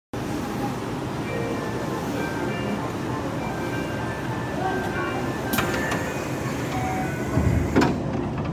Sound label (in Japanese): 寺田町発車, train sounds